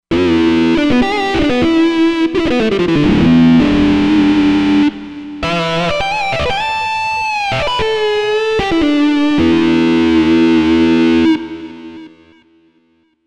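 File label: Guitar Lead; Moore Lead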